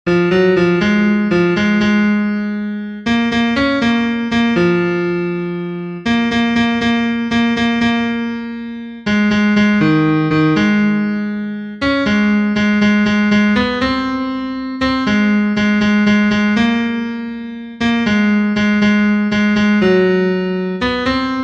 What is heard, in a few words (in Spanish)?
TENOR, himnos en piano; cantos del camino